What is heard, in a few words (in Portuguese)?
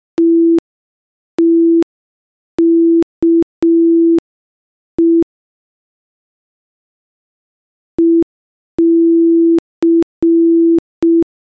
ritmo velocidade